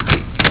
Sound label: begin; gemdropx